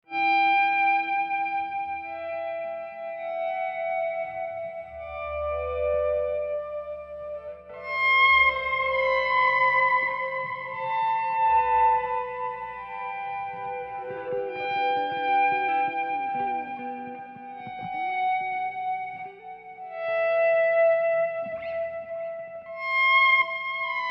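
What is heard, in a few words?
Volume Swells, guitar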